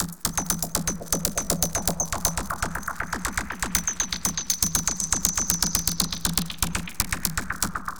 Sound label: Subtractive Beat